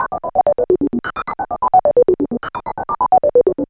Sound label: Sh101 Loop, 130BPM